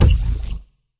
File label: fx drum, Electro FX